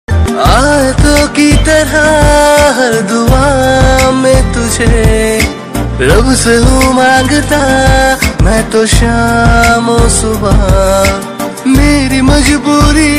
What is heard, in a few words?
Romantic Hindi Ringtone; ringtones